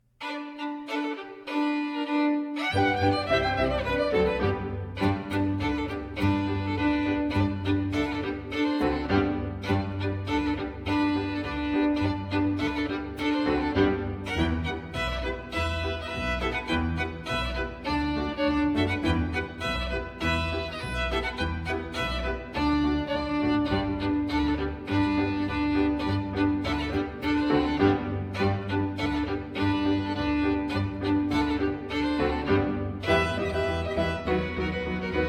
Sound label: Hen Cackle Rag